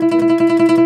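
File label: OUD tremolo, Plucked Strings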